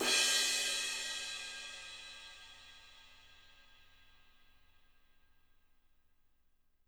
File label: crash, crashes